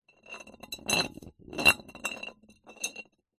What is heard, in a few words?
bottle 660ml glass beer empty short roll on concrete